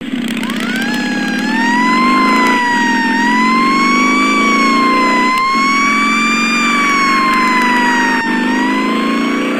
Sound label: Motorcycle